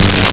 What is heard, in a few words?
Finger Bass G3; Nintendo; July 2020 Gigaleak